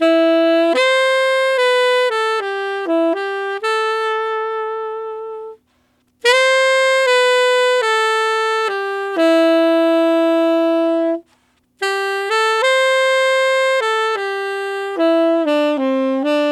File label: Tenor Ballad in A min, Tenor Sax Loops; Sonic Foundry (Sony Creative Software); Jazz Solos and Sections